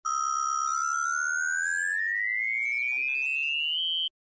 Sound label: Button Buzzclick Mul